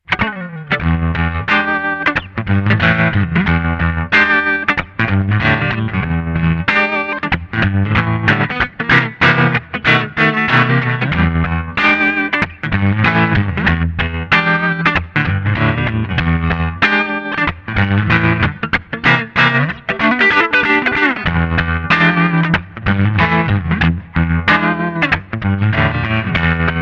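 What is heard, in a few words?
Framus Diablo Neck HB, guitar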